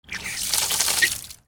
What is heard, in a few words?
HOUSE